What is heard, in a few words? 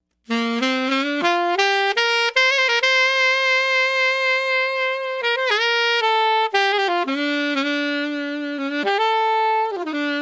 alto